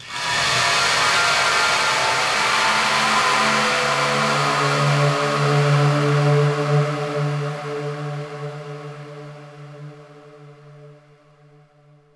ice; DAoC

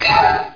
Exclamation; Hexen II

